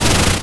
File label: Player Slide; Travel Run